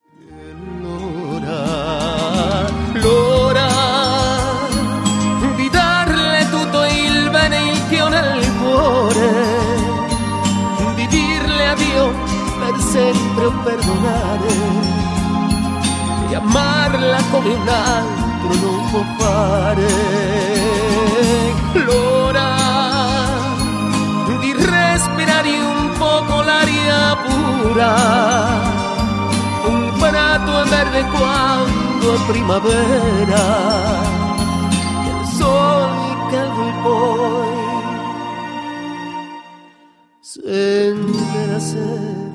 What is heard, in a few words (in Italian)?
Chitarra suona piu piano